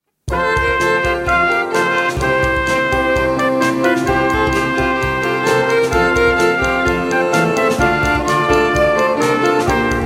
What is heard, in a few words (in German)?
Violine, Flügelhorn, Stimme, Drum Set, Klavier